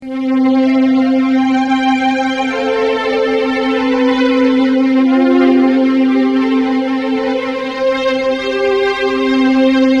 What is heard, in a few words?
strings